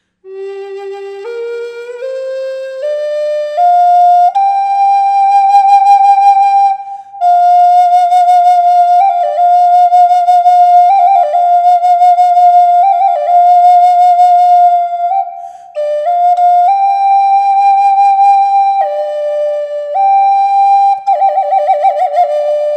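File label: reverb, flute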